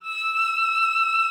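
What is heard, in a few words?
E6, cello vibrato; Xsample VOL-7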